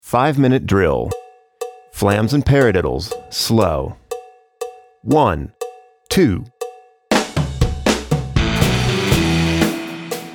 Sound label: pad flams paradiddles slow